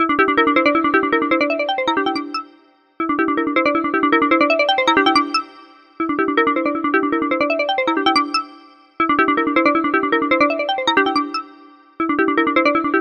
Hover Trip Alert

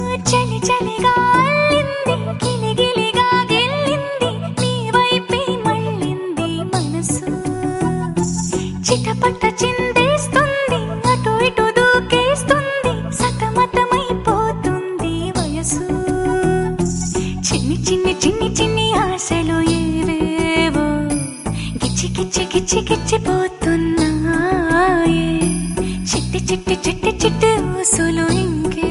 Female

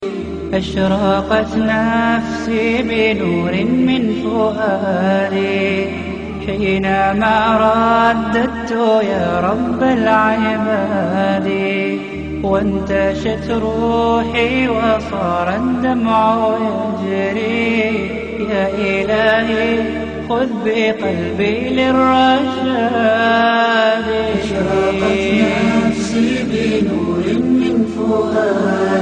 Islamic Ton

